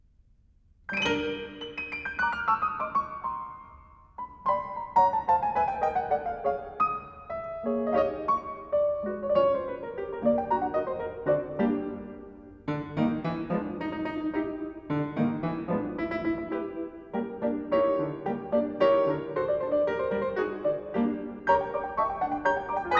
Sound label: for piano, Variations; I. Le Comble de la distinction; Poulenc